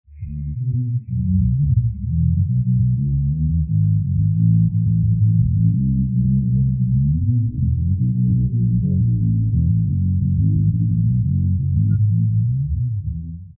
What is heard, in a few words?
bass; spleeter